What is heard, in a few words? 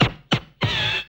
FX Dance